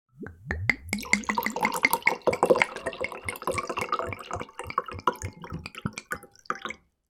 food drink wine pour from bottle into large glass; sound effects one

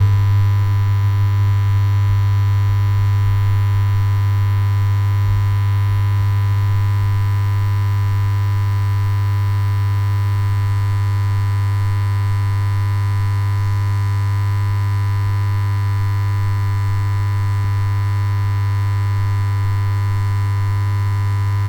industrial light neon buzz

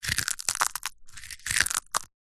food crackers squash crack break crush in hand; zapsplat

